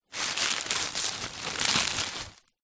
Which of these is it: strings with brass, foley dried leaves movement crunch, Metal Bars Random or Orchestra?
foley dried leaves movement crunch